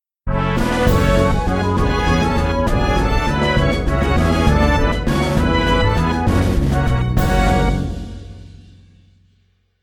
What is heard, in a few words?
Jingle Stage Start; Gensou Shoujo Wars: Dream of the Stray Dreamer; Meko